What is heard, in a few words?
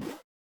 retro game jump